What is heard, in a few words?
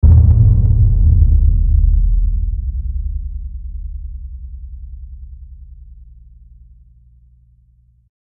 noise